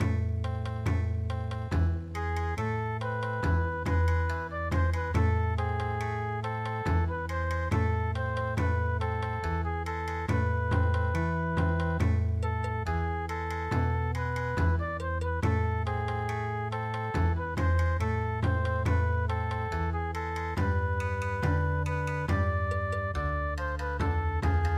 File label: Bon Odori, midi